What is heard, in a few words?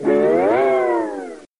cartoon spring